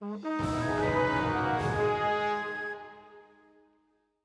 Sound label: jingle battle win; Sentry Knight Tactics